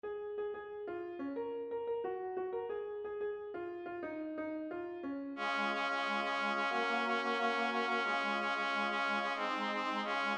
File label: Midi